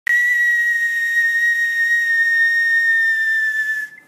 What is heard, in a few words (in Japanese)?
椿笛の音